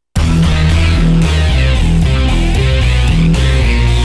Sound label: Sore Loser; WWF SmackDown 2 Know Your Role